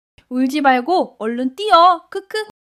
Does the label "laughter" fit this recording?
Yes